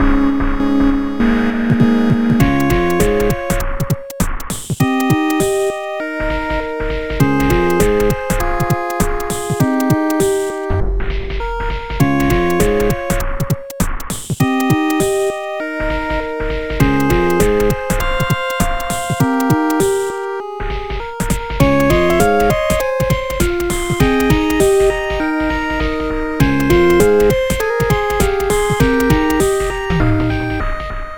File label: White (Internal Percussion)